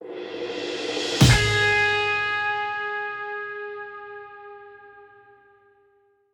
final boss phase tail end tag 200bpm 2 beat pickup